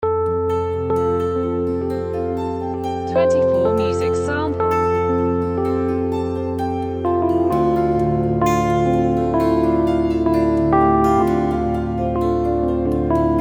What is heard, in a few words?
epiano samples